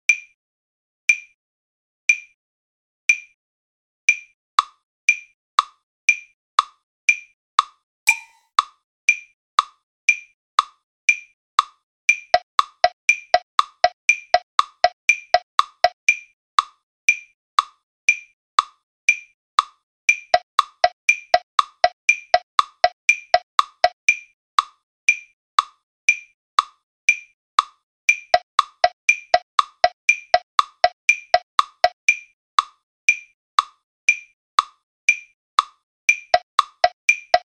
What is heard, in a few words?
Semiquavers